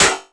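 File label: snare drum, Drum & Bass, 160 BPM; Ueberschall; Drum & Bass Flash Trackz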